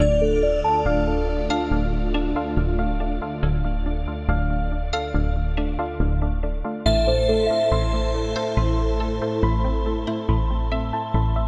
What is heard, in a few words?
atmospheric tension; music; zapsplat; Photon Voice